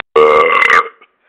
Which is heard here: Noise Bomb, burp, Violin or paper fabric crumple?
burp